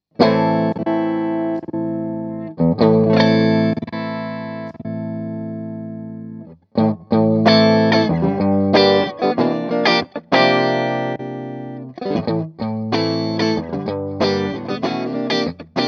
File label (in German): Tele Moduliert